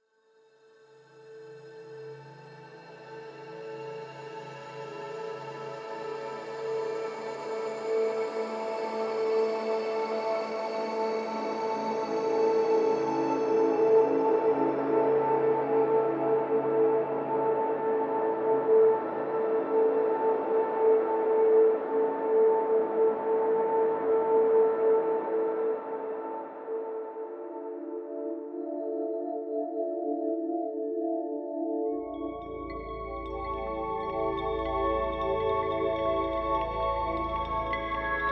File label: Explore Day Electro; Horizon Zero Dawn